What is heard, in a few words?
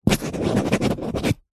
office pen biro write sign signature on glossy paper